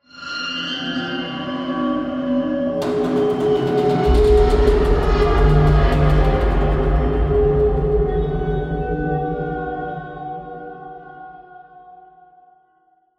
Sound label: ambient building metal; strider